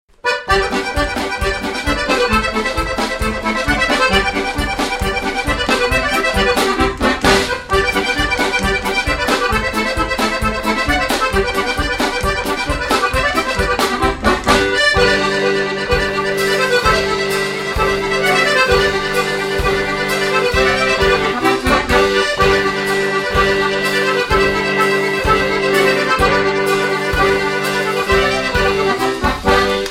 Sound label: jig, accordions